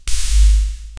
big smooth explosion of s, smoky

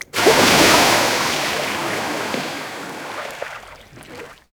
realistic swimming pool s

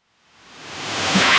futuristic uprising sound, build up

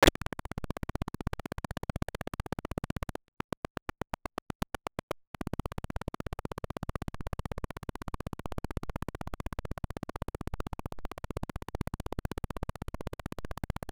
crisp 8 bit pop clean zaps, digital hum

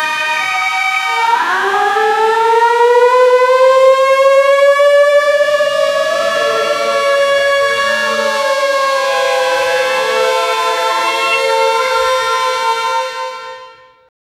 german siren, transport; rtk 7 siren stadt

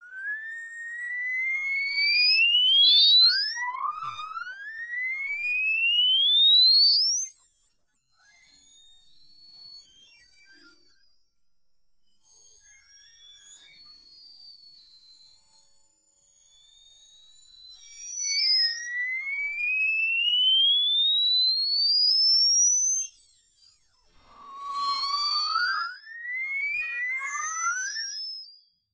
sounds of alien civi, ufo

